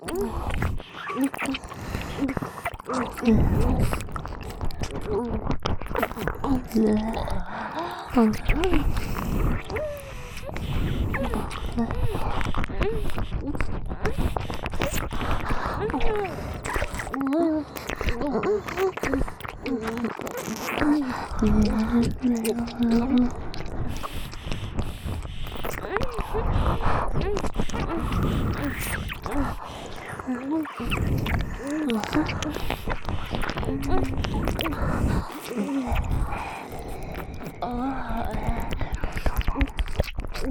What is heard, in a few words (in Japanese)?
イラマチオ＋れろれろ両耳舐め